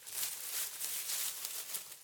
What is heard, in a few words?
Bushes rustle; Phasmophobia